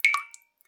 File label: Drips